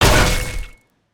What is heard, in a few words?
Spider Boss On Hit; creatures mechanical